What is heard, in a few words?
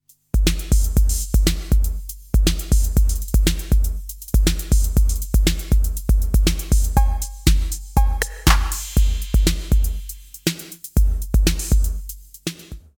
808 attack non lin verb